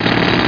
Bull Doze; Sim City 2000 Demo; SimCity2000™ Interactive Demo

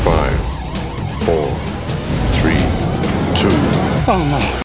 COUNTDOWN; BigMax nº 9

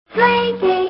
slinky exclamation

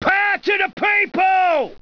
Rioter; Caesar3

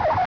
Wheel; F/A-18 Hornet 2.0

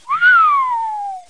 woaw; Rooie Oortjes 3

